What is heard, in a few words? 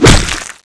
knife attack